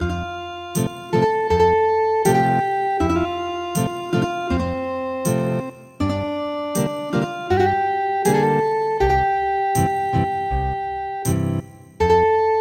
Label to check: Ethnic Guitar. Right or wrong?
Right